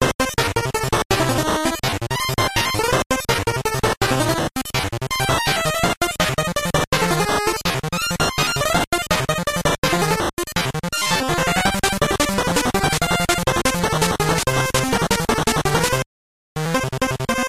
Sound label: Alarm titlescreen music